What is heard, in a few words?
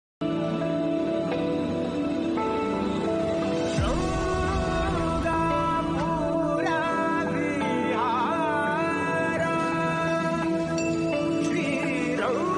classic bgm; devadasu; nagarjuna